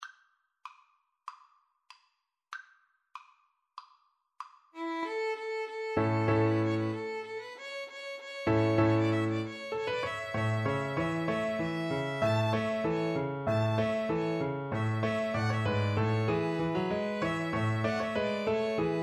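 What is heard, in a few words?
violin piano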